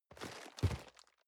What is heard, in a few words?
cardboard box medium turn; objekte